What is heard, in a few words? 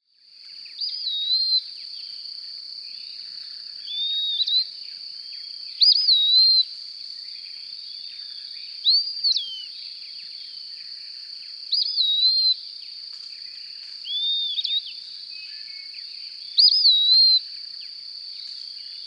Eastern Wood-pewee